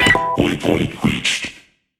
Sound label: Autopilot Checkpoint; World Of Warships